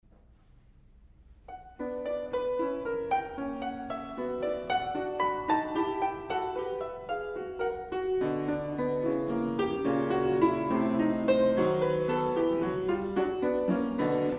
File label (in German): Hammerfluegel, Klaviersonate; KV457; Schiff